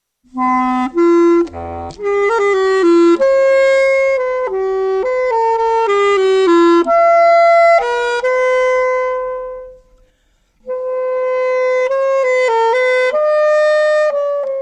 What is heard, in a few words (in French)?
instruments cor de basset